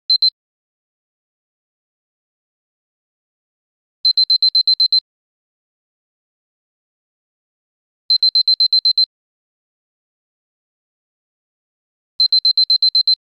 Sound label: Clock alert, phonetones Nokia; Nokia E7